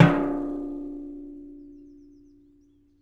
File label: hit, rust kit; dry; Nucleus Soundlab; Ambient Drums